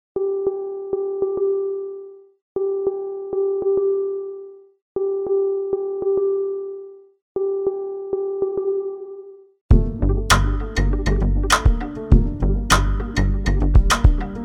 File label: Hip-Hop